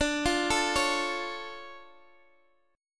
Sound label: D Minor Major 7th, D, down, Guitar DADGAD